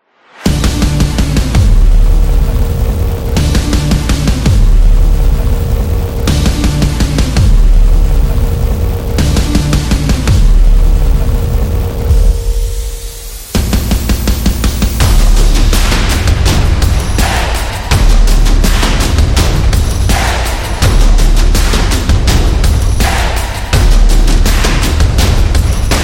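The Soccer Trailer, Street percussion background music built for sport, motion, and dance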